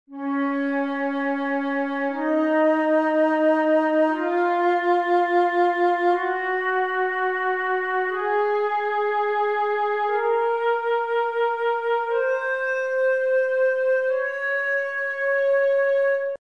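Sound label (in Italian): re bem maggiore, scala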